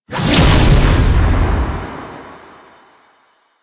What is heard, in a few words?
Explosion; Warframe; Quests; The New War; Narmer Outpost; Chamber Archon Intro